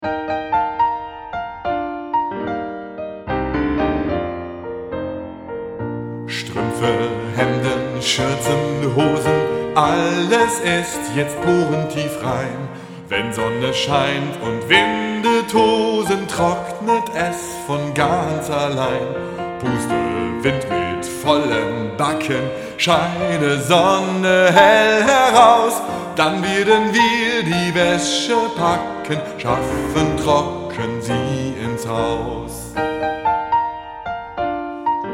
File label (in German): Waeschesong; Zauberer von Oss